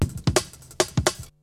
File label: BREAKBEATS, TECHNO